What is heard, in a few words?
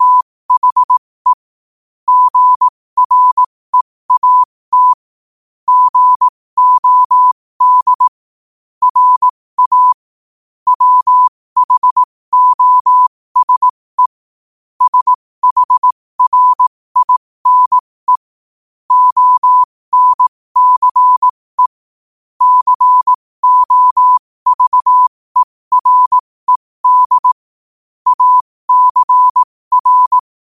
5 wpm, morse